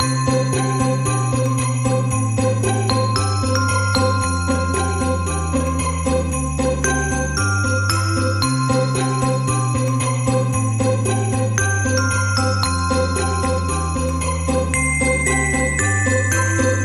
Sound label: Upbeat Acoustic Christmas, Loop